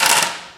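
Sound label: physics clipboard impact small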